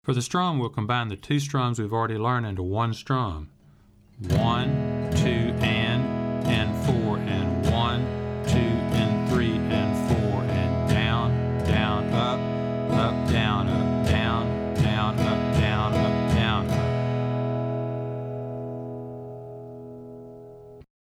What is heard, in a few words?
Strumming